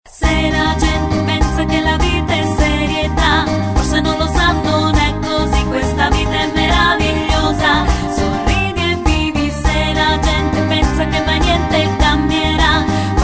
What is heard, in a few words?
radio soysalsero; Soysalsero JINGLE sigla